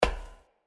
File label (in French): Footstep passerelle metalique; Blind Survivor